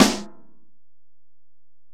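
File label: Acoustic Snare, Alesis DM-PRO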